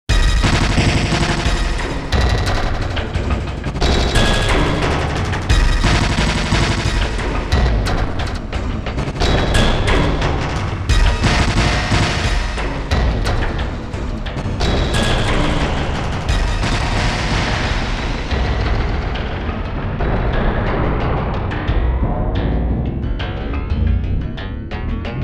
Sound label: Sequential Prophet X Disturbances